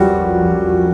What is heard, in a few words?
Piano Chord